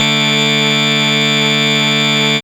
TECHNO; X-Static Goldmine 1

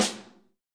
AMB KIT; Real Mega Drums

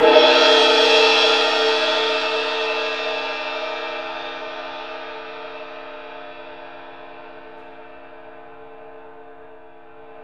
CYM CYMB, Orch Crash; Roland LCDP03 Orchestral Perc; Orch Cymbals

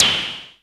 ELEK SNR; ELECTRC

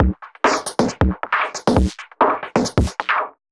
Beat Mod, 136bpm, uk garage

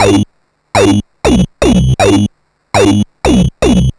Sh09 Bass Loop, 120BPM

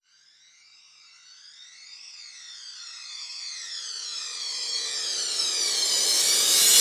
Reverse Cym, Cymbals

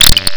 ROBOT NOISE; Big Beat Samples & More; AKAI

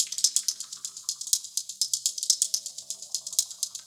Glitchy, Beats; Sonic Foundry (Sony Creative Software)

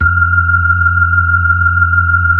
B-3, Hollow; Keyboards of The 60's and 70's

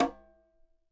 KILLER CONGA

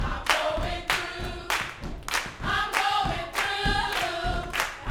Vocal, Gospel, PHRASE; Spectrasonics

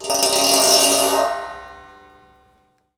Prepared Drums

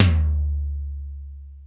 Toms, Drum Machines E-MU Pro-Cussion

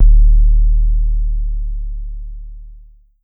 Pollard Syndrum Sub

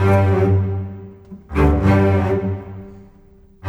Bass Cello; Sonic Foundry (Sony Creative Software); Orchestral Series VOL-4; Rock and Pop; Rock-Pop 16

